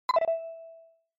Smartphone UI Sound Dry

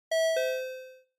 Quiz Ding Dong Slow Single; クイズ ピンポン02-4(遅 シングル)

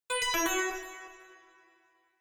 Chime Announce

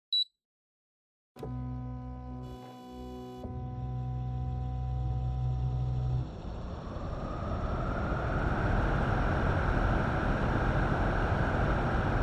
Air Conditioner Mid

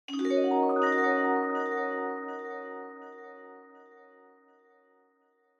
Multi Accent Low Delay

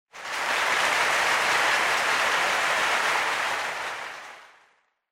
Applause Crowd, Mid

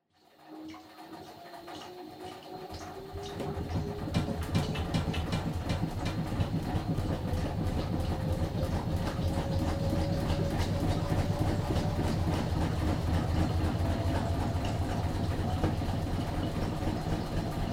Washer Front Load Noise Spin

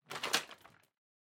Vacuum Cleaner Head Pick Up

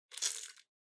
Spice Mill Pepper Shake

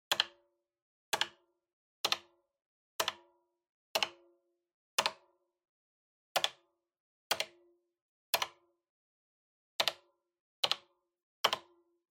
Calculator Single Mid

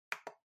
Trackpad Click Far L

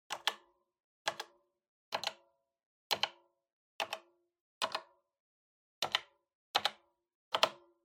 Calculator Single Gentle

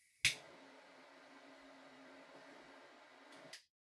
Lighter Far Click Jet

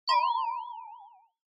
Onoma Negative Low Short; オノマトペ ネガティブ; 低 短

